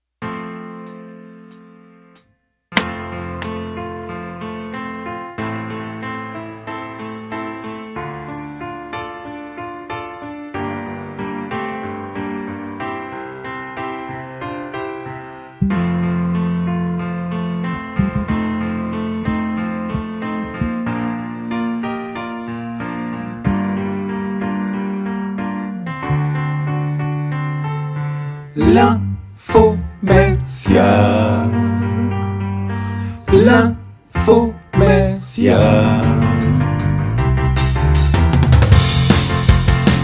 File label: informercial music